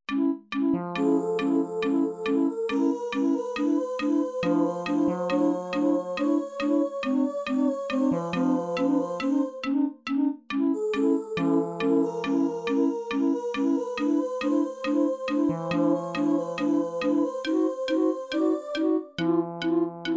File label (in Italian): Ostinato quarti di tono